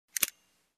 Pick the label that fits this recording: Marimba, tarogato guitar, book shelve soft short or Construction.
Construction